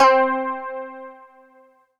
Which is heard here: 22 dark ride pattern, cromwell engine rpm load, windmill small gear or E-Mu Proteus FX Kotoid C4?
E-Mu Proteus FX Kotoid C4